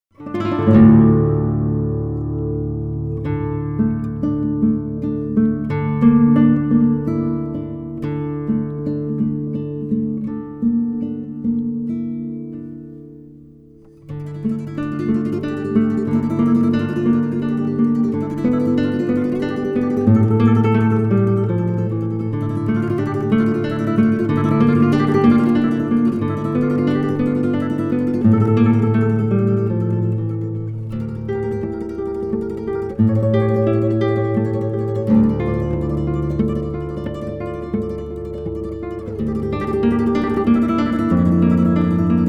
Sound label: Classical Guitar